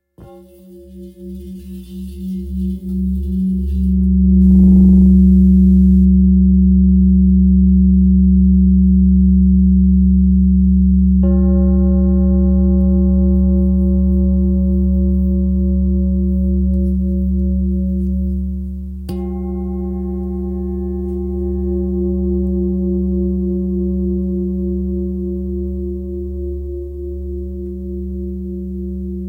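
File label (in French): Bol ramgandi do, bols chantants tibétains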